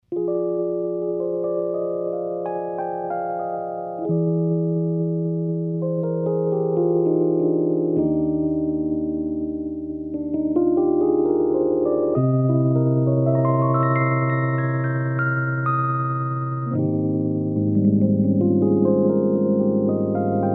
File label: satellite vibrato, electric piano; silver